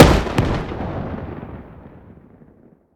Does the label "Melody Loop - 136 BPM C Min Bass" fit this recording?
No